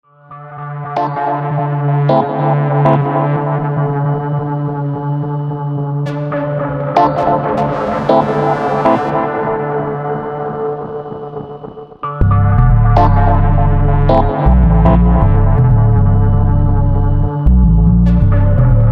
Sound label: Inst